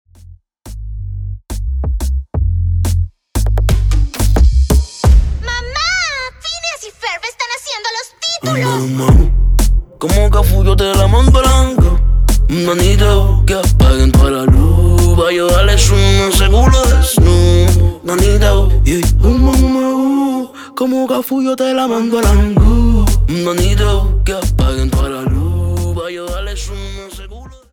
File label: Intro Outro 89 Bpm